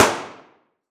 Weapon Gun Pistol 45 Caliber Single Shot Interior Shooting Range; AdobeCC